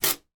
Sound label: water spray bottle jet of water hit window, household